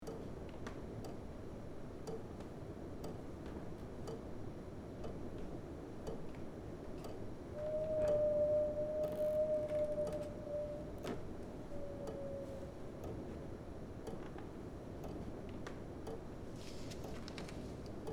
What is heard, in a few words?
Creepy victorian mansion ambience exploration loop